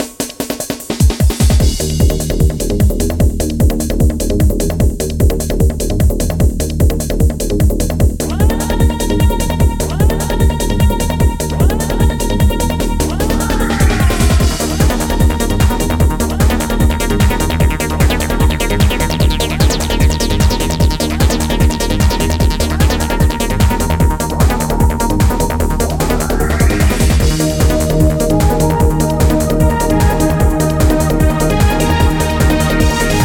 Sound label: Trance